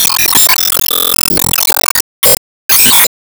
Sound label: Enemy Tail Active Meteor